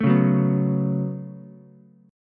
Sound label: B 7th Suspended 2nd down Ukulele, chord; B7sus2; strummed; JGuitar